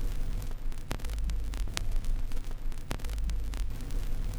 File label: crackles, Vinyl